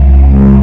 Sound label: Shield; wipeout